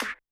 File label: SD R&B